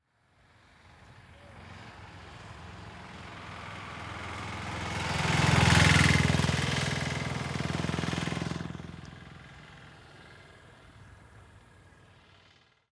Auto Tuk Tuk Diesel Auto Rickshaw Pass by fast speed; Tuk Tuks; The AudioVille